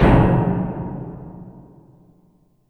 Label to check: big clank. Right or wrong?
Right